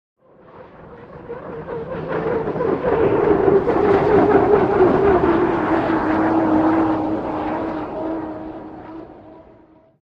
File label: Hydroplane By Fast